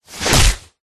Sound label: human punch powerful designed; zapsplat